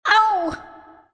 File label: Gingerbread Boy Disappear; Cookie Jam